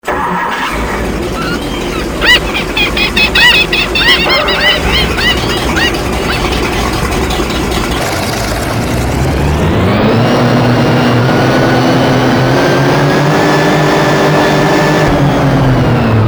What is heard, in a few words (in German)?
Schiff Riva Aquarama